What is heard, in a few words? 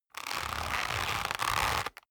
leather creak medium